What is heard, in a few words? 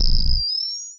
F4 G4 A4, interface